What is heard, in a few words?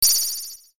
thrown; message tones